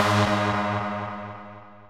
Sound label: lead1 last